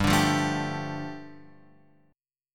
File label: G 7th Suspended 2nd G, Guitar Open F; strummed; JGuitar